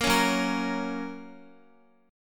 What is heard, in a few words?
Gb Major Gb Guitar; Gb strummed; JGuitar